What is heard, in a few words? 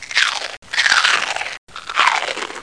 dinosaur chewing; PUZMANIA